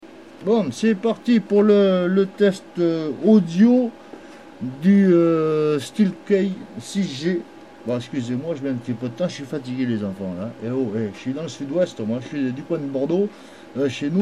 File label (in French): Echantillon Steelkeys G